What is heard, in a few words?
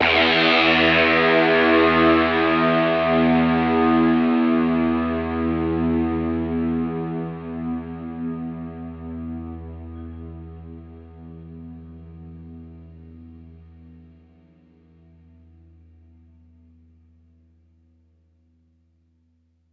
Guitar Notes E; Zero-G - Future Wave